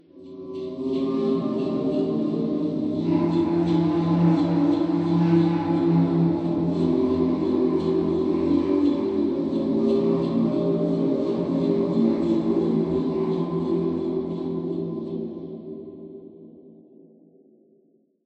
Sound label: Jazz